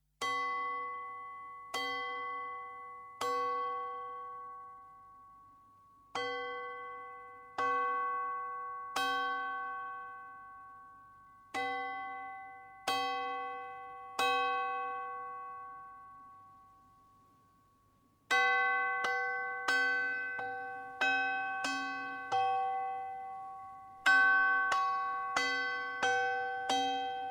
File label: global disarm call music